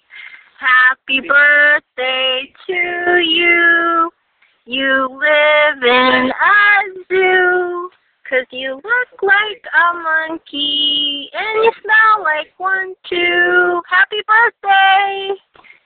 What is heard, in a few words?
Birthday Song, answering machine incoming message tape